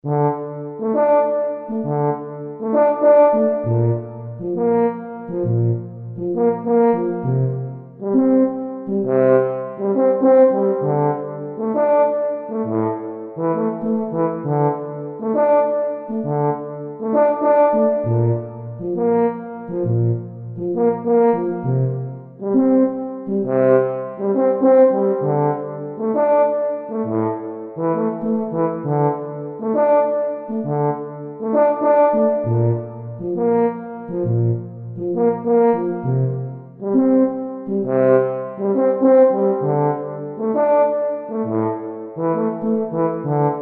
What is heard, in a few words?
Tuba